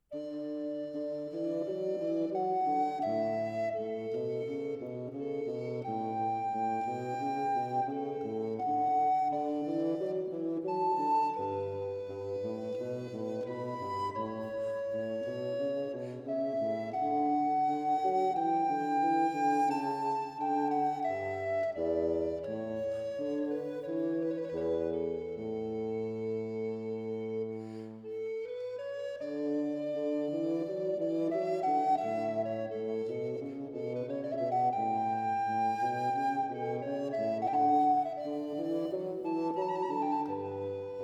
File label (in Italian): Concerto in Sol minore, Largo; Antonio Vivaldi (1678 - 1741); Vivaldi - Concerti e Cantate da Camera (2002)